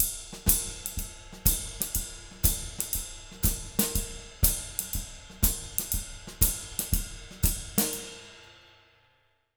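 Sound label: 120 SHUFFLE, Jazz Latin Drumloops; USB Soundscan vol.08; AKAI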